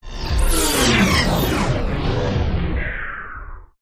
Modulated Fall Sweep

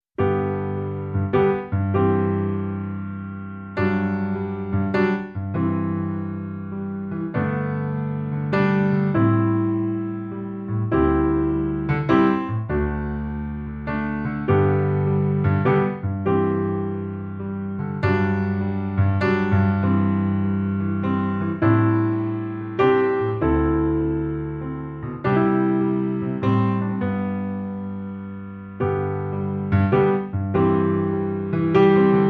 On A Sunny Sunday, Jazz Club Piano Only; 60sec

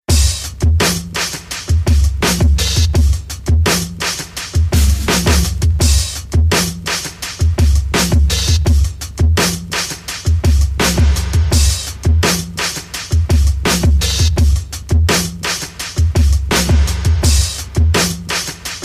Earwax Beat

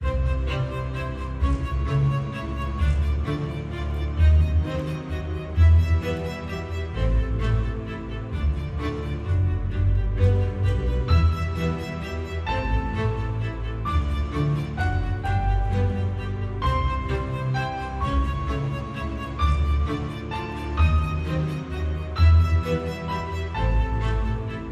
Underscore

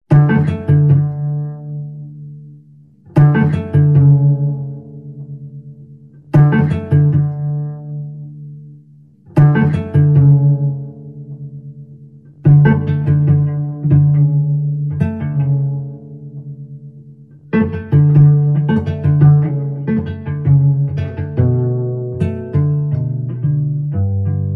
Contemplative Cello

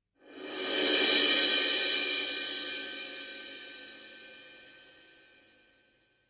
Light Cymbal c

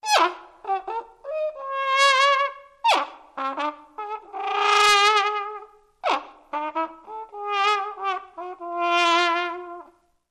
Trumpet Comical Drunk

